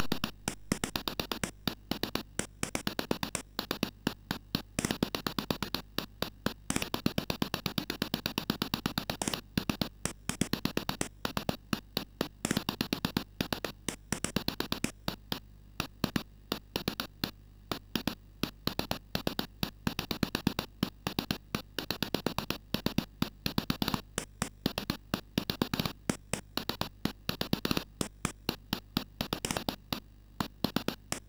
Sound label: Stepper, MOS6581R4; sid